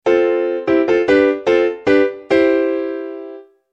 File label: Winning sound effect piano